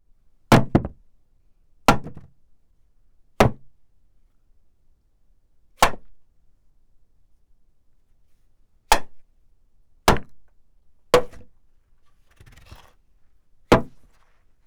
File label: Ram Horn Single Hits Various Impacts; Foley; Props; Foley Essentials